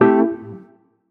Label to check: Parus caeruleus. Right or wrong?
Wrong